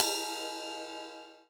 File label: Sabian AAX 20 Ride; BLACK PEARL